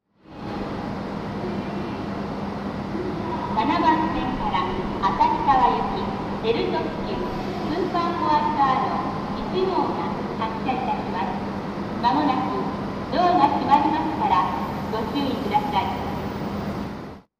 sapporo departure, station; jrhokkaido

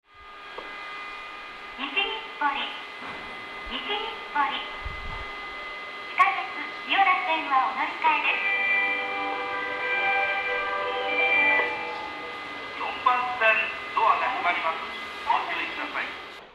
nishinippori melody, station, yamanote; 4番線（京浜北）発車メロディ; jreast

